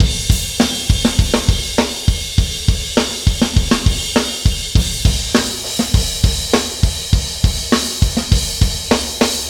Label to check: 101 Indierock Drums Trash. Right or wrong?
Right